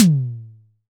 high tom single hit d sharp key, tom one shot tuned to the D# note; tom drum